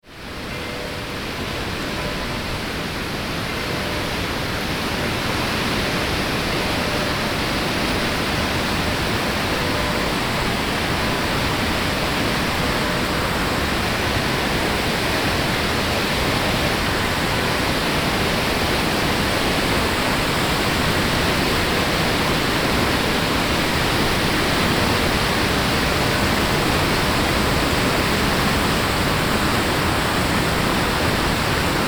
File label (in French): cloches; venosc